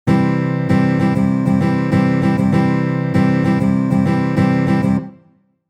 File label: stroke, guitar